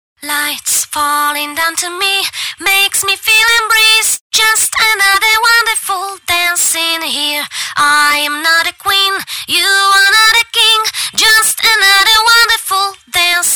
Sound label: vocals wonderful dist